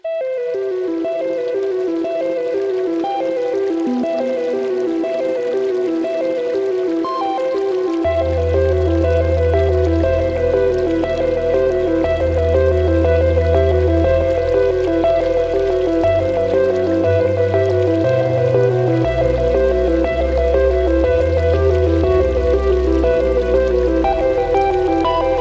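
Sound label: Overworld Cove Loop With ambience; Rad Rodgers Radical Edition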